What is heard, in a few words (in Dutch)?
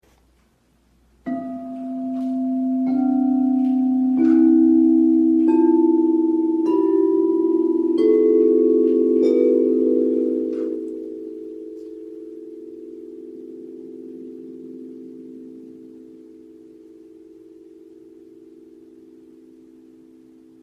aardetonen klankschalen set; 7 chakra kristallen klankschalen aarde set